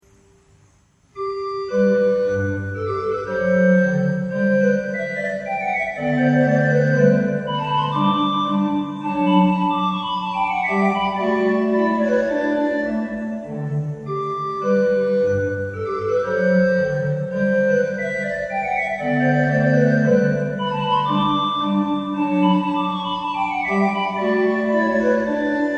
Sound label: sonata in g andante